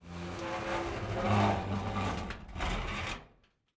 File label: metal stress; Counter Strike Model Pack; CS:GO; survival